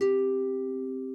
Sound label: harp